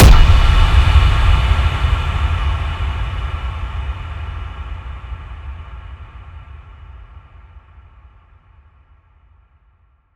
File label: impact metal toms; Virtual Riot; Impacts